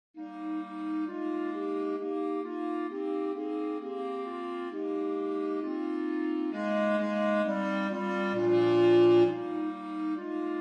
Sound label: Durufle Ubi caritas bas; Durufle, Maurice